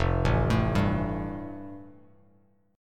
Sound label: F Major Add 9th, Bass Standard; Fadd9 arpeggiated; JGuitar